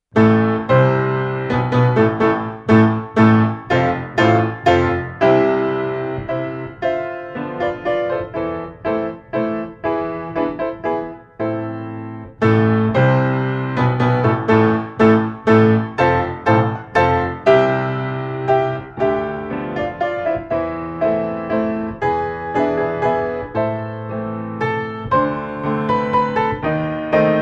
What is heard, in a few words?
Waltz Am; Schubert